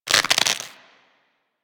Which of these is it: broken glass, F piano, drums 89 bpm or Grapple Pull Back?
Grapple Pull Back